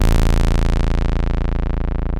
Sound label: TEISCO, BASSES